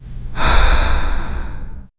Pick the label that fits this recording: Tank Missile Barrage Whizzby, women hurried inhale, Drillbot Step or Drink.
women hurried inhale